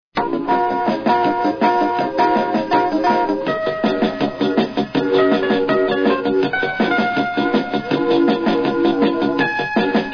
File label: piano